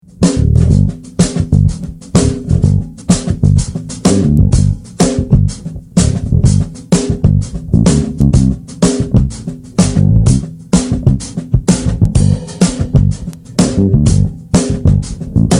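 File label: Grooving and Walking, bass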